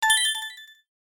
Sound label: Coin Small Group Appear; SM3DL